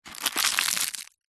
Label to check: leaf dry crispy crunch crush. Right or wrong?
Right